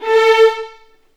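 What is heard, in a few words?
A4 violins; The Ultimate String Collection; East West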